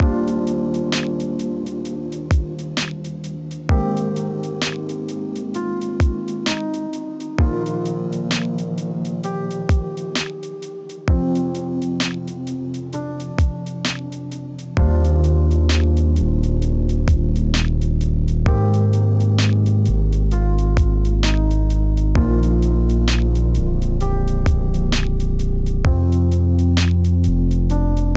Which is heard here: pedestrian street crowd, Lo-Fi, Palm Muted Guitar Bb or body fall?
Lo-Fi